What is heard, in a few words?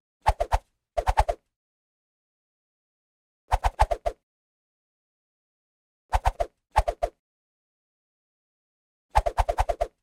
Swishes (Light-Fast); Hollywood Edge; Martial Arts and Human Impacts